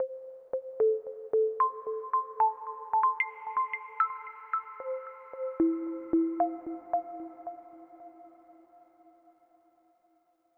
Overlay Tone

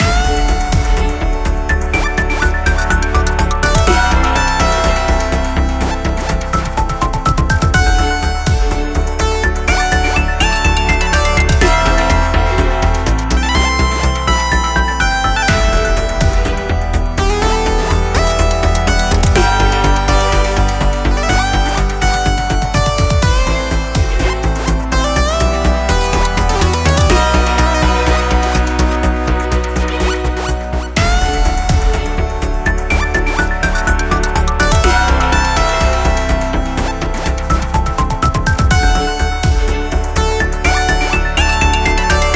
Tech Menu; sky rogue

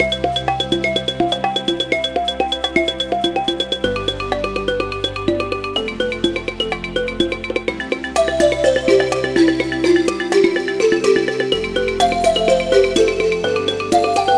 drums; the humans meet the ancestors

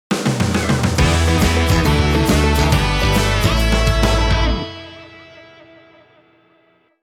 Power Activation; Advance Wars 1+2 Re-Boot Camp

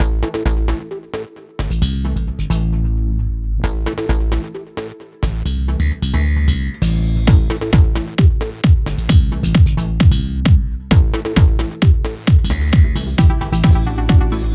Funk Intro; Funklift

